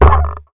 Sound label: Chair Composite